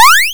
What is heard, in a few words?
collect; DelphiArcadeGames; AlienInvasion